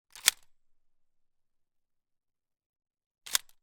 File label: gun model 12; still north media